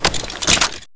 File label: ui unequip Armour